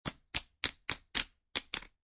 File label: Poker Chips Stacking; Poker, gambling, chip: Stacking poker chips.; Card Sound Effects; Casinos; Lynne Music